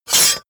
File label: sword cut hard slash